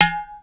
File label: udo finger, Yamaha Motif ES drum machine; 300 Drum Machines; Yamaha Motif ES-Series